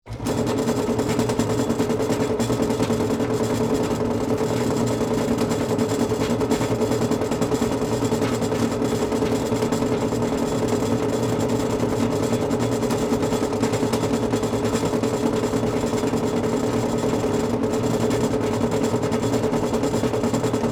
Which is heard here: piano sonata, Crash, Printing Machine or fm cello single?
Printing Machine